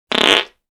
fart

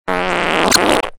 fart

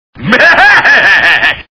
bahaheh

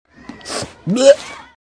bleurgh

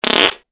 fart

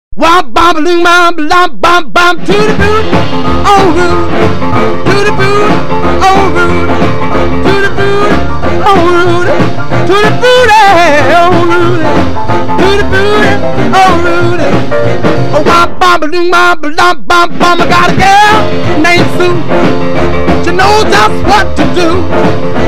tutti

